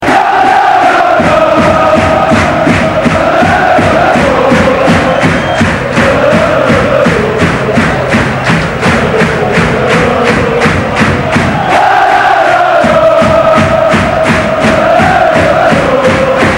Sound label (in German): Dauergesang